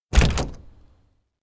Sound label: door naproom open; anemoi